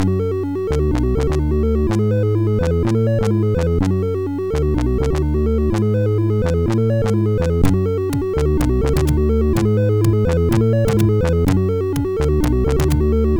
intro, MOS6581

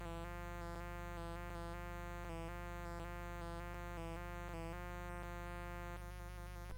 Alarm; SoundEffects

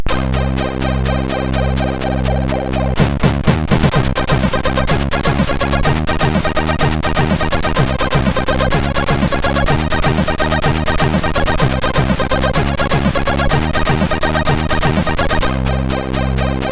Static House, sid, MOS6581R3

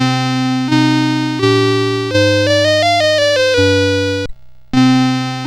Renaissance sid CSG8580R5

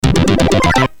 Jingles, CSG8580; hvsc

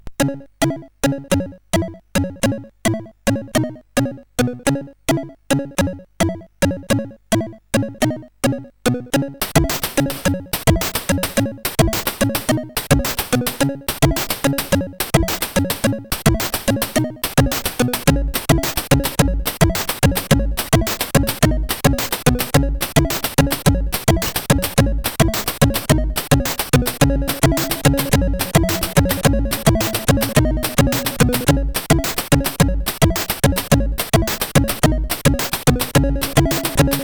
ringtone, CSG8580R5

